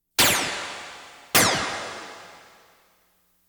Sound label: Science Fiction effects, Lasers, Light beams